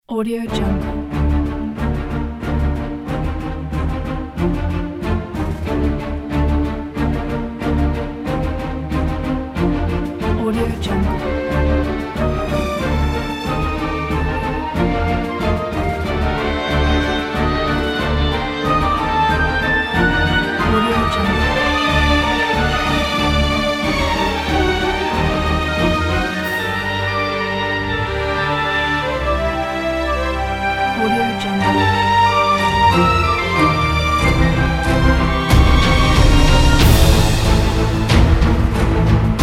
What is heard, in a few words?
cinematic adventure